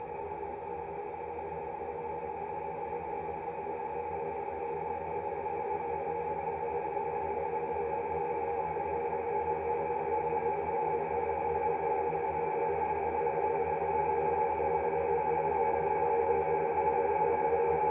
Sample Tri Tones Seven; SoundChaser; CerebralAudio

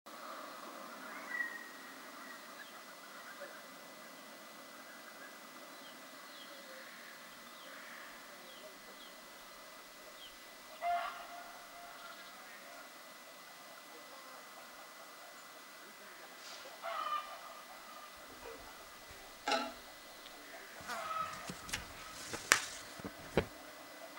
Piano

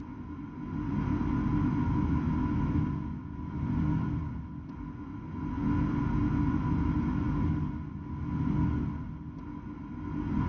Sample Pulse Two; SoundChaser; CerebralAudio

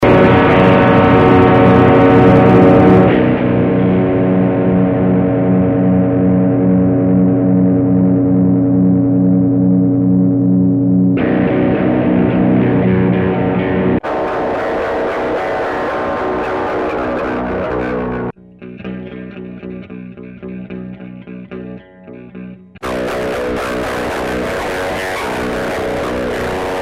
guitar lead

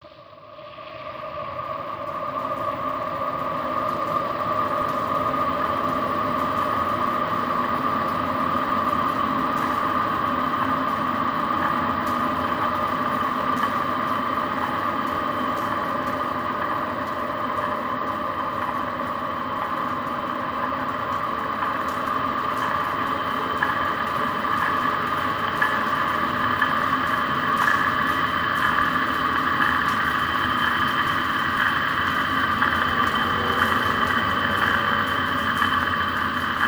background interference; erratic; activation fields

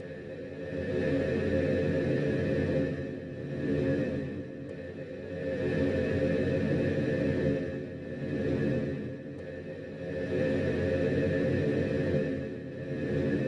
Sample Pulse Three; SoundChaser; CerebralAudio

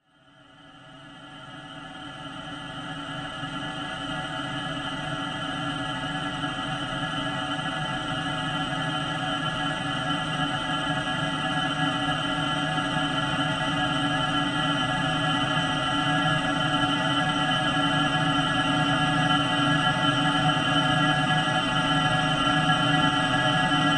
Sample Tri Tones Submix Two; SoundChaser; CerebralAudio

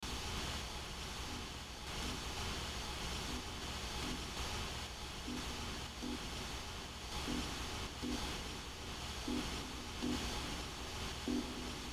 sad noise

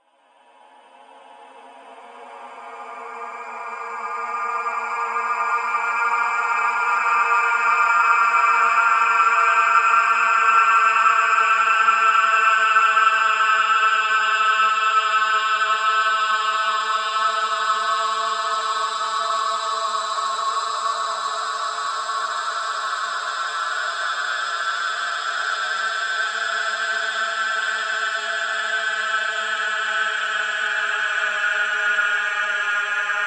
Sample Lower Shimmer; SoundChaser; CerebralAudio

